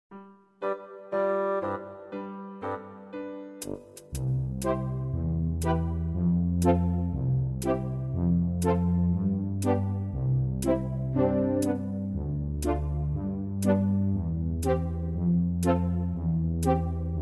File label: Instrumental; E dori chikito